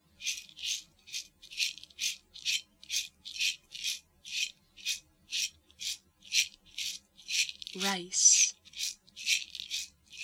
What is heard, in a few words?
flute haiku; rice